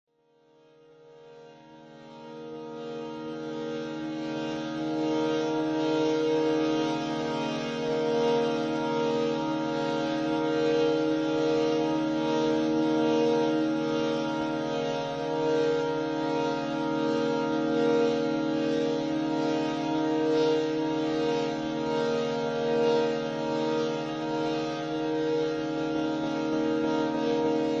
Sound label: shruti box